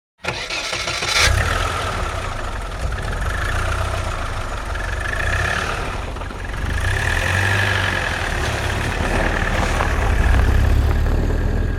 Truck starting up ignition and fast departure

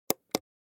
Long click

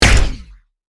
Sci fi rifle futuristic single shot

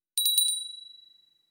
Small silver steel handbell ringing

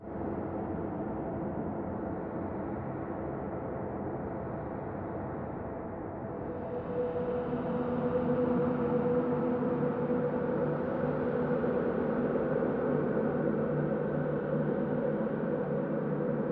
Drone atmosphere desolated and tense

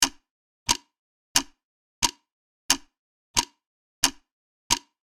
Passing seconds ticking time; shorts sound effects

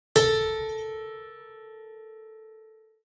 Plucked piano string with reverb

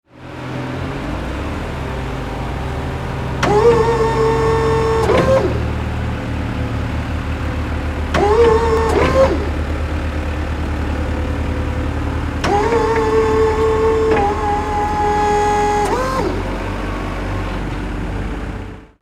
Forklift digging worksite operation

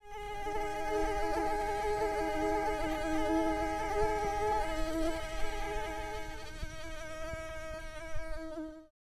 Mosquitoes buzzing

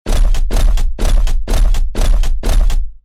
Robot juggernaut footsteps running

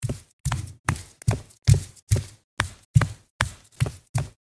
Socks fast walk on concrete

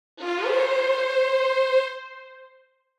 Cartoon violin slide up; cartoon orchestral

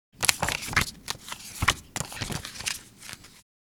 Casino playing cards handling a deck of cards

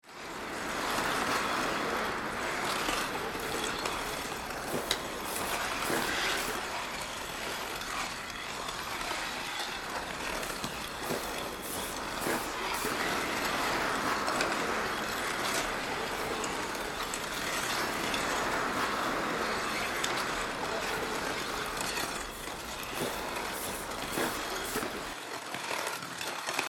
Ice hockey arena ambience skating no crowd

